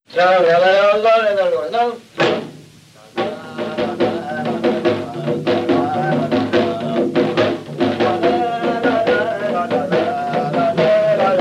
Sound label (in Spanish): Paloteo; Paloteo "La pandereterita" de Mucientes